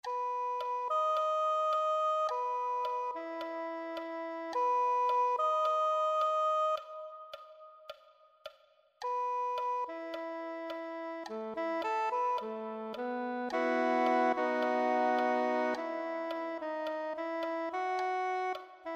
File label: soprano saxophone